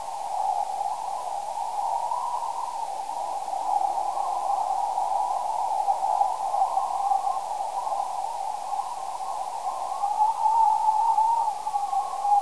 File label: windy